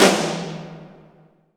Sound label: toms, cinematic impact, drum